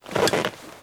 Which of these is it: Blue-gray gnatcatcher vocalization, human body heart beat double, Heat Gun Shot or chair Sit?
chair Sit